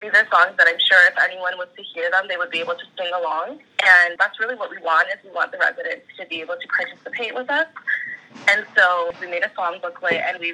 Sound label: choir